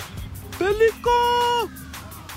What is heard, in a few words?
pelican